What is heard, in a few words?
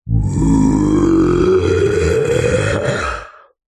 horror male zombie monster or creature soft warning growl; zapsplat